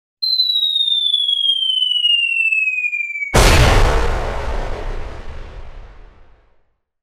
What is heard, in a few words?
Bomb; Soundzabound